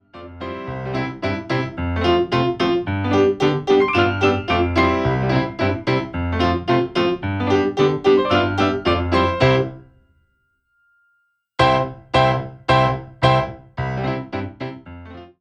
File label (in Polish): instrumental z linia